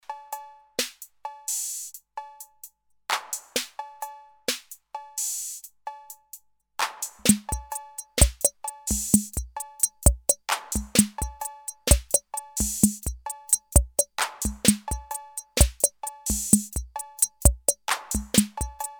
Roland CR-78 und TR-808 Groove

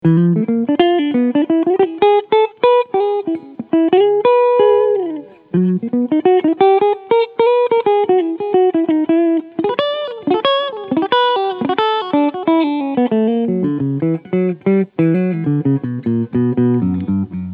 Gretsch G2420T Cleansound beide Pickups

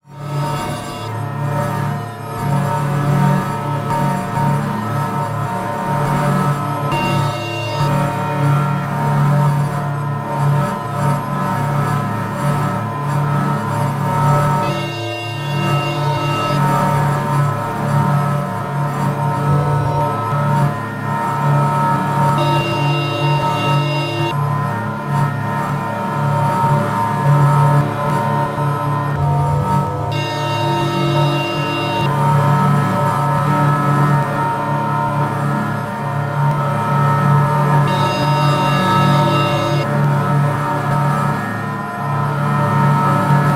ADDAC 112 VC Looper und Granular Prozessor Drone Loops Dunkel

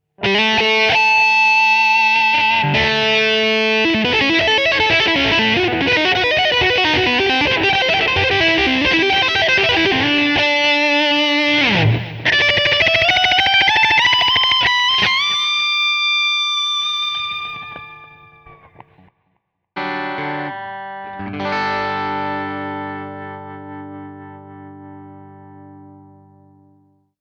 Solo Steg HB

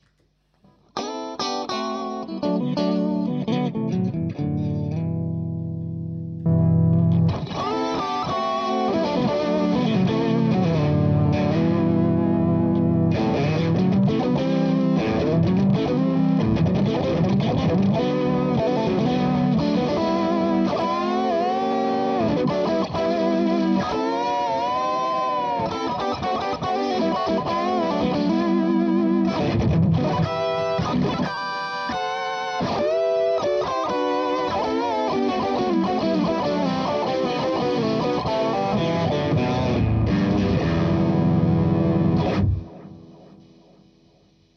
Harmonie; plus ST-2 davor

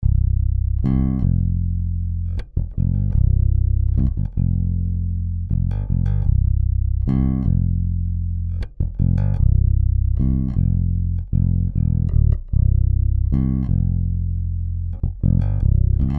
Bass Original

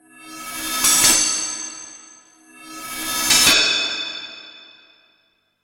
SFX Reverse und Cold Steel

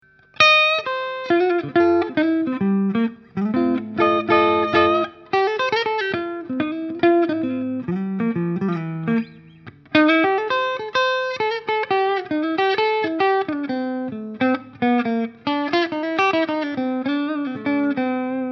Alle Pickups clean

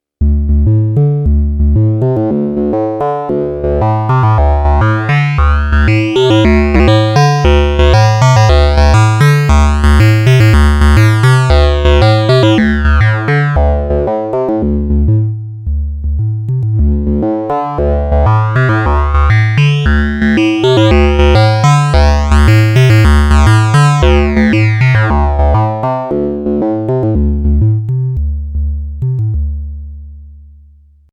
Wellenform Fold

